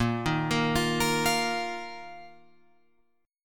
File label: Bb Suspended 4th Bb down Guitar Open F; Bbsus4 arpeggiated